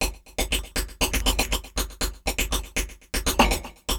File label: Percussion Loop; Drums & Percussion; Electronic Point Blank